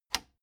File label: plastic button latch loose release disable